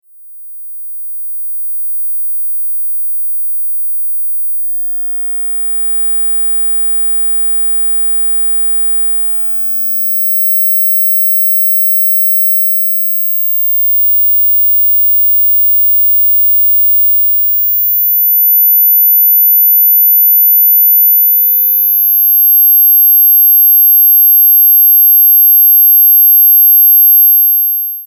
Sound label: mosquito transitional six freq, ringtones